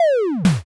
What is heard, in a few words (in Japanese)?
zukoo